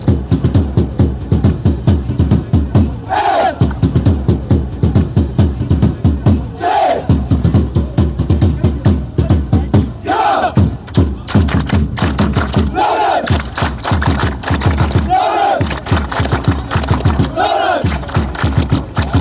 fan chants; fck lautern

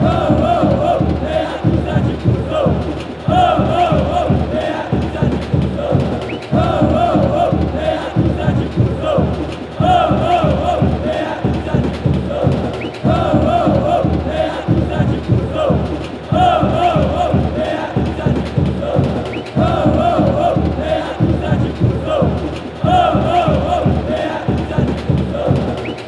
fan chants; meia duzia

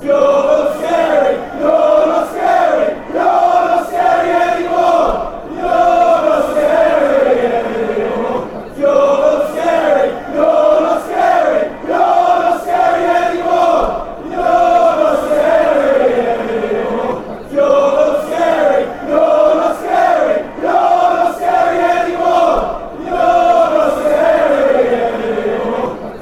youre not scary

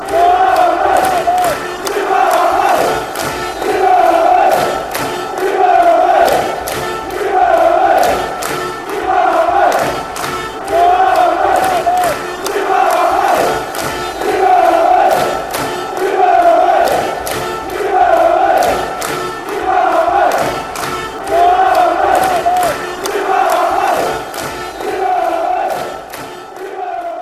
green and white army ni fanchants

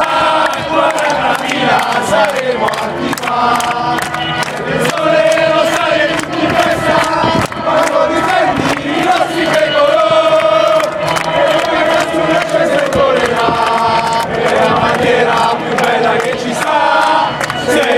hooray pescara pescara anthem

